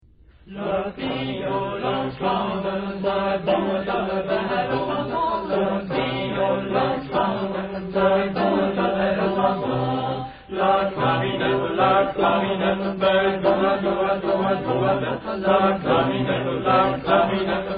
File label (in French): orchestre